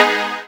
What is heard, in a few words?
Synths C; Vengeance Samples